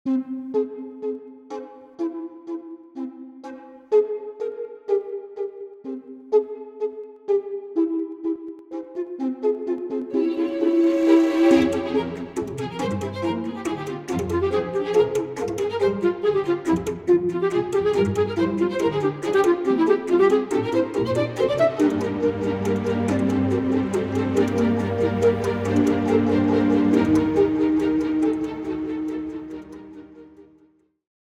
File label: bass recorder; solstice; bass recorder sample library; elastic sounds